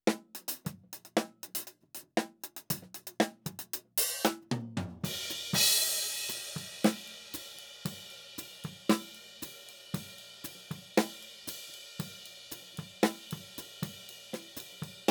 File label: Hat